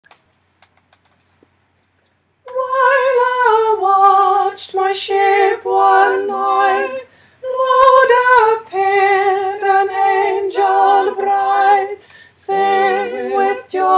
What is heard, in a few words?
echo carol tenor